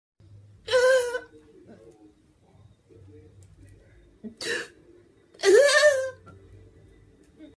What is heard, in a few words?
eugh